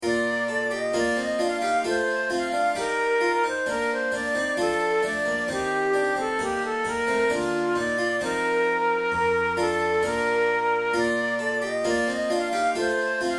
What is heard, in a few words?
hornpipe, flute